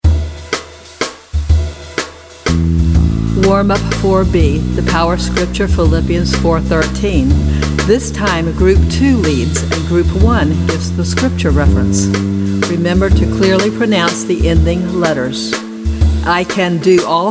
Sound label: Vocal Warm Up